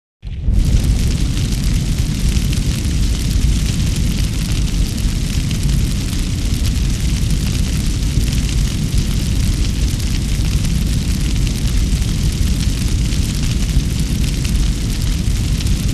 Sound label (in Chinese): 圣火点燃